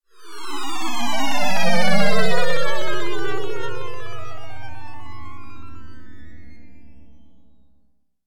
Martian Spaceship Landing

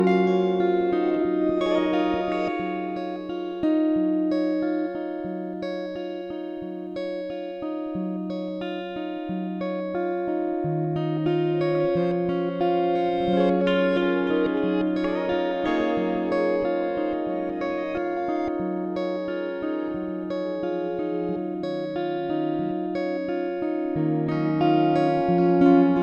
Calm Gentle Chill Solo Guitar 4 4 120bpm

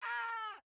Female Hurt

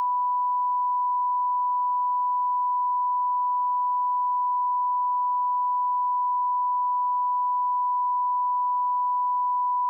Generic Censor Tone

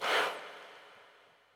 Breath

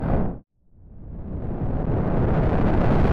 Air Rush

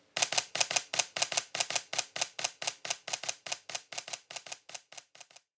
Typewriter

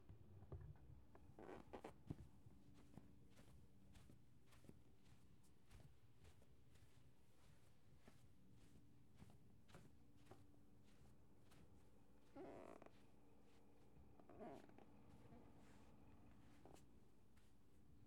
Walking On Carpet With Slippers Shoes

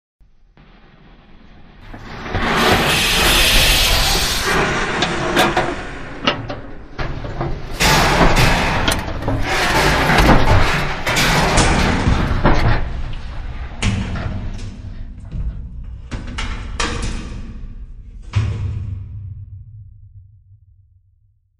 Heavy Mechanical Doors

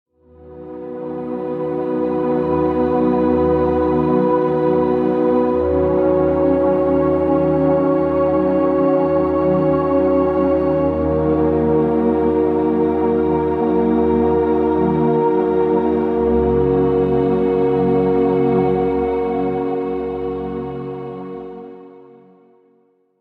Ethereal Music For Space And Serenity

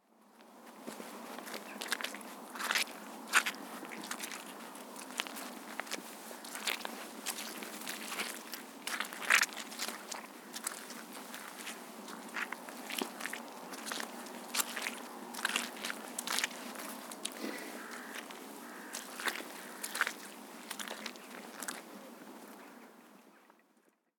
Footsteps Mud

